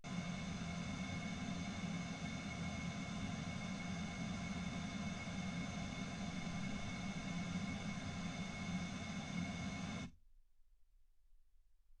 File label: pink noise 48kHz